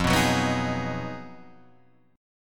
B 6th F, guitar chord; B6/F Chord {1 2 4 4 4 4} strummed

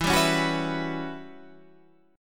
E Minor 6th Add 9th guitar chord, Standard; Em6add9 strummed; JGuitar

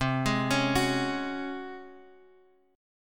Db Major 7th Suspended 4th C

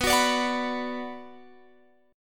B Minor B Guitar Open F; strummed

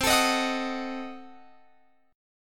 C Diminished 7th C, Guitar Open F; Cdim7 strummed

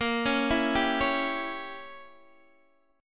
A sharp Diminished 7th A sharp, Guitar Open D

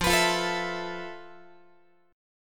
F Major 7th Flat 5th, Guitar; FM7b5; x,13,14,15,13,15; 4A,4E,5A,5D,5G#,6C#; strummed

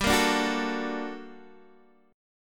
G Minor Major 9th, Guitar, DADGAD; chordshape; down

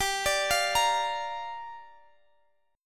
G Minor 7th, Guitar, Standard; arpeggiated; capo 9; chordshape; x,x,17,19,18,18

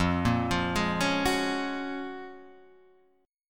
F sharp Major 9th

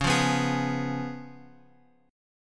C sharp 7th C sharp; C#7 Chord {X 4 3 4 X 4} strummed

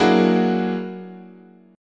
F sharp augmented 9th, chord

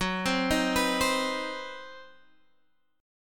C Minor Major 7th Flat 5th Gb, chord

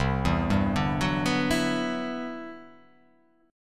C Major Sharp 11th C down; arpeggiated; CM#11

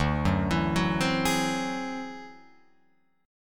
D 9th Flat 5th D down Guitar Open F; arpeggiated; JGuitar

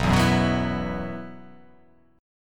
B Suspended 4th B down, chord; Bsus4 strummed; JGuitar

